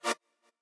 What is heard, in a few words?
chord